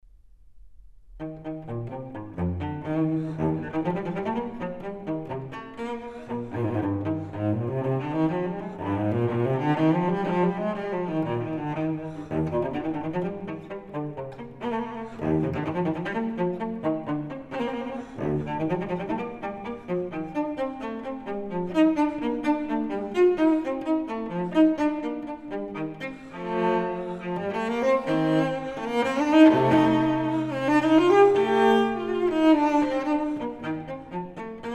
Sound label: Suite for solo cello No. 4 in E; Bach; Cello Suites